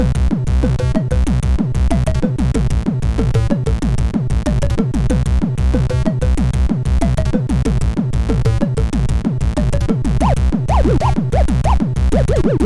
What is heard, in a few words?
Music, MOS6581R4